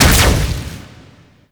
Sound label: Spin Beam Start; Enemies; Weapons; Warframe; Tau; Sentient Dactolyst